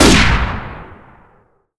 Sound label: fire